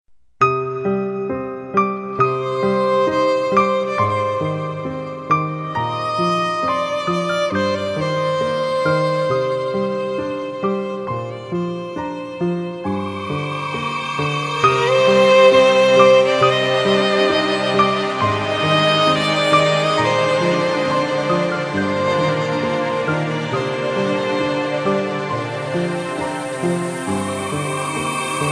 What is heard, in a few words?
touch piano